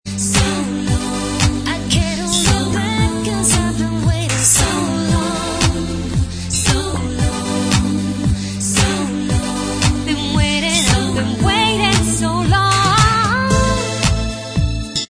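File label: backing vocals, backing track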